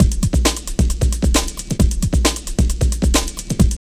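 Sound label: drum loop; X-Static Goldmine 3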